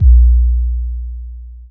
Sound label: bd boom; drums; one-shots; kick